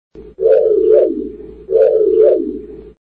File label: scanner sound; KITT's scanner (Knight Rider)